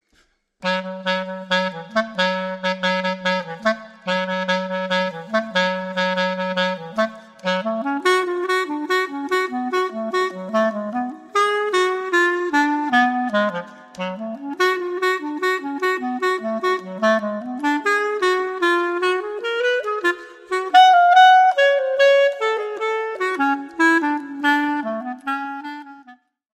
rock toccata

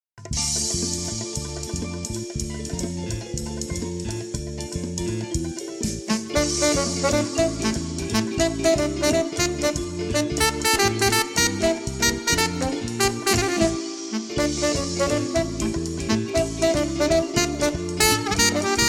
Tenor

